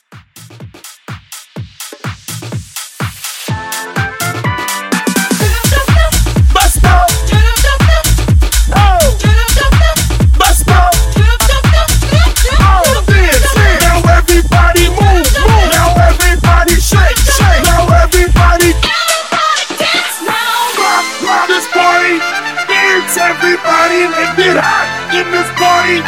Blend 125

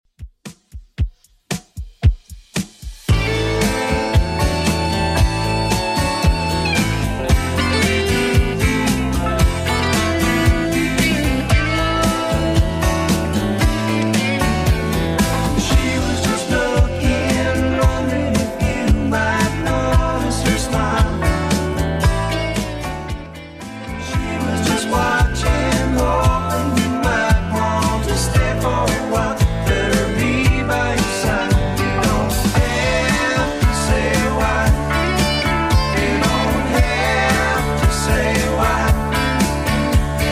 70s Redrum 114bpm

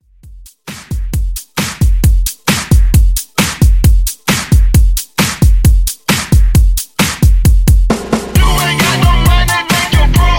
Hyped Re Drum

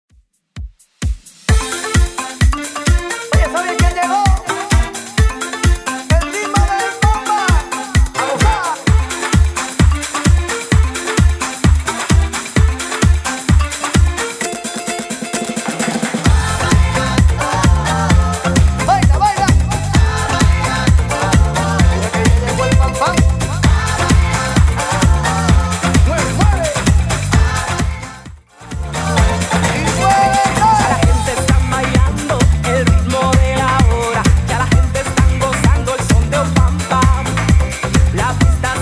90s Redrum 130bpm

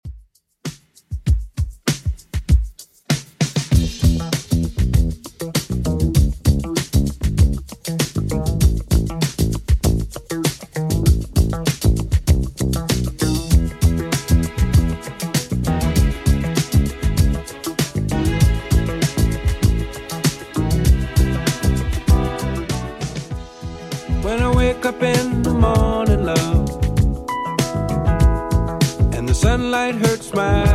70s Redrum 98bpm

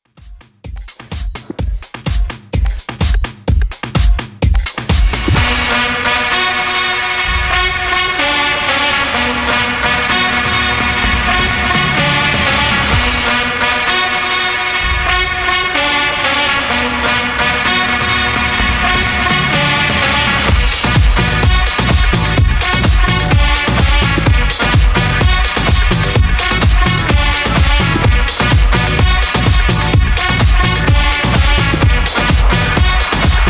House Redrum 127 Bpm

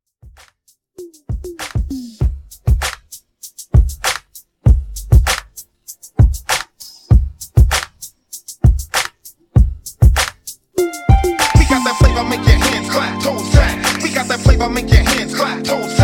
Hype Redrum

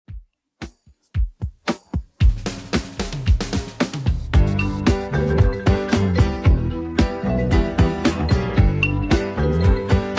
70s Redrum 113bpm

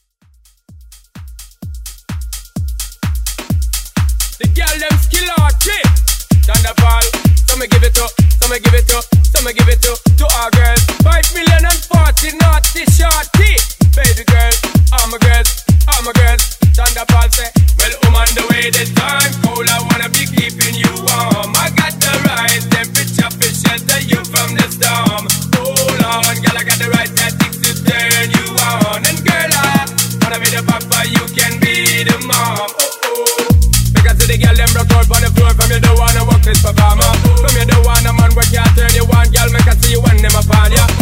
Drums Mash Up

